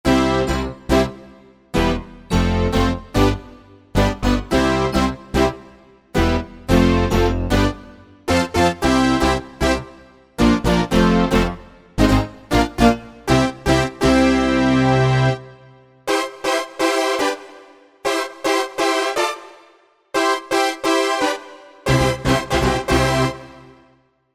Rock Stack, Korg Wavestation A-D

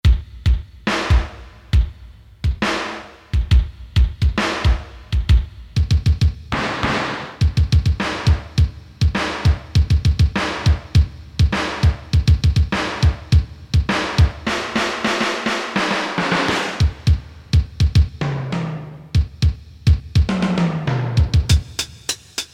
Drum

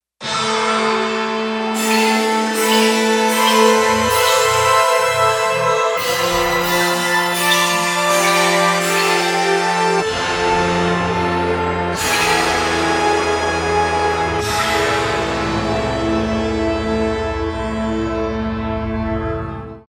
FX Menu

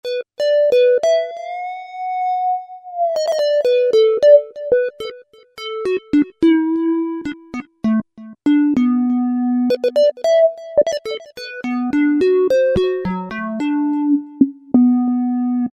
Triangle Lead, Korg microKORG; Factory Voices

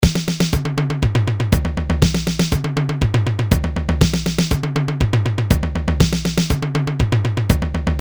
Fill in 8 Beat

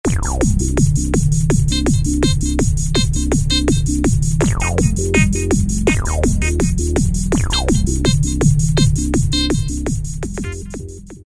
Robotechno, Alesis QS8; Factory Preset examples

